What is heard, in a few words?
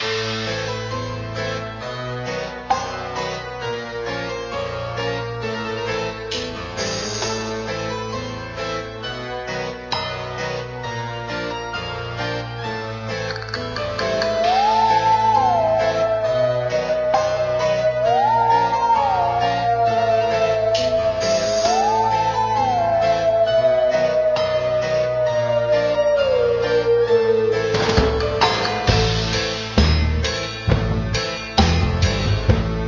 Level Theme; Jungle Adventures 2; Android